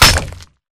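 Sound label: Bullet Light Ballistic Concrete impact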